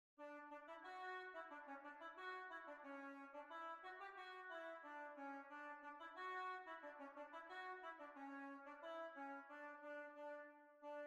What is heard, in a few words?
Hornpipe; Miss Richards Hornpipe